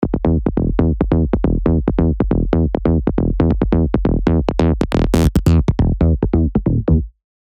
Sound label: Sub Punk, techno bass; Spire; revealed spire techno bass vol 1